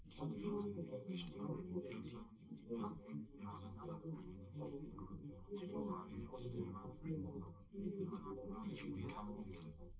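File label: male, live recording 250ms 1m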